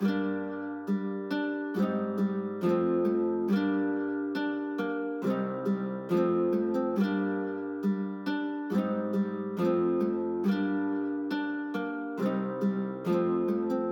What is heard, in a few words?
Nylon Lite F, 138